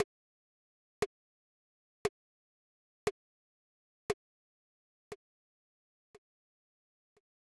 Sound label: Tick Tock; captain tsubasa iv pro no rival tachi; snes